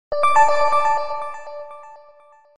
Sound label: cool notification tune ringtone